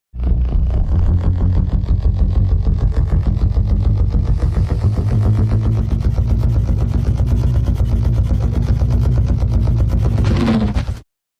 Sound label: lightsaber spin